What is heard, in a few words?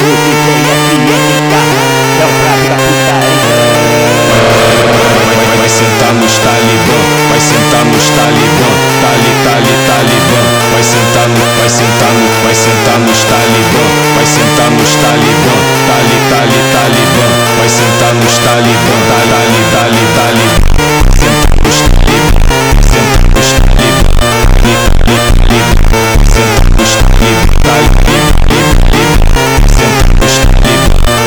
Melodica